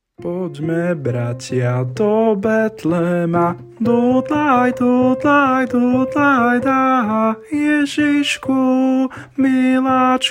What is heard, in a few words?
tenor, song; Podme podme